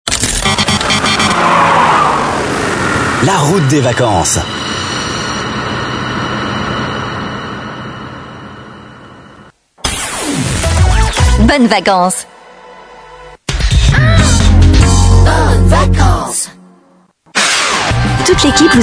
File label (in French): JINGLES VACANCES